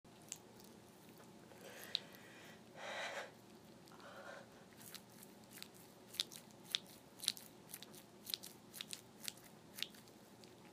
squishy sounds, wet noises, squeaks, really loud moans; F4A; GWA Solo Sesh